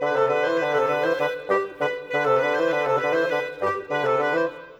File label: Bassoon Clarinet, Orchestral; Sonic Foundry (Sony Creative Software); Orchestral VOL-2